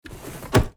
drawers dresser close

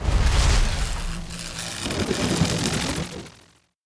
shock charge

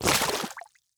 water walk

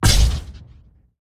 ward block

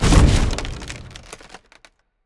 alteration oakflesh fire

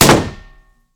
shield heavy block small

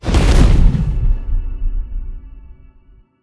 shout ethereal

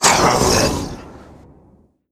draugr attack

